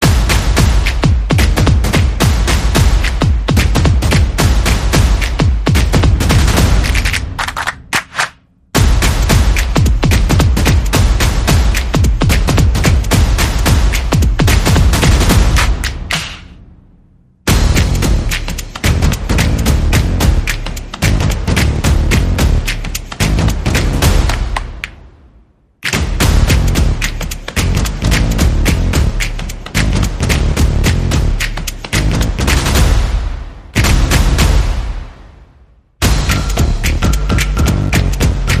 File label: Swing, Percussion Bass and Effects